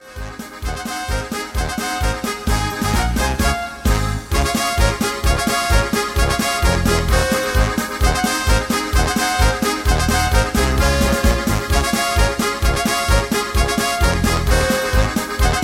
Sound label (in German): trompetenecho